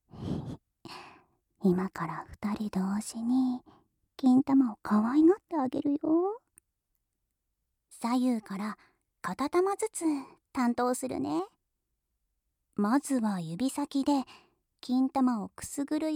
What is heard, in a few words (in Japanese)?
耳舐め,ASMR; マゾタマの錬金術師; 耳舐め錬金